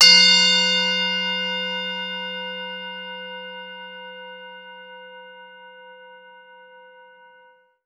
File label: bells, bowls